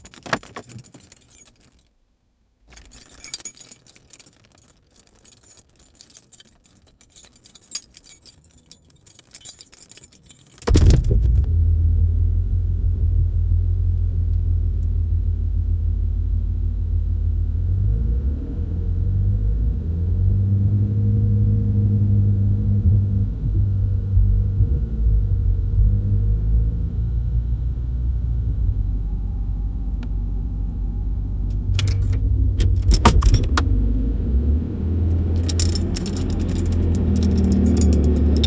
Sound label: nissan rogue car key; 2019